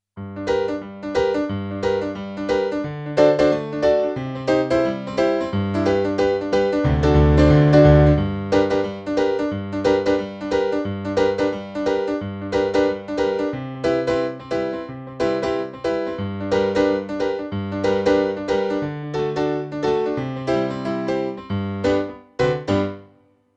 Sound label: Piano, RNR for piano